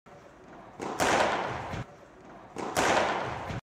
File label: Padel; ورزش پدل